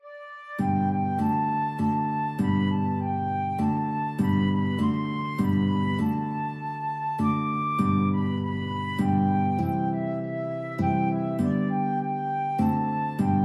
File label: thousand tongues FL GUIT, guitar flute duet